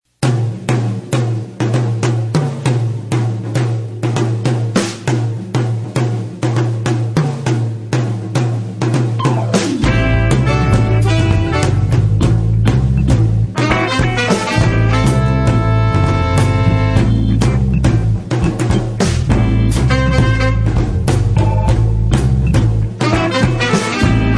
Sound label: Shaker